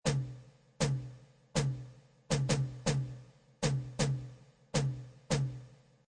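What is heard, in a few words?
Loop orchestral toms 160, Toms loop 160 bpm.; Drum Loops; Music Production Loops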